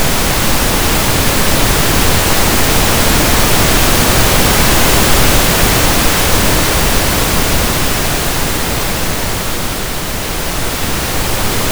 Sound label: L+R uncorrelated pink noise medial-lateral alternate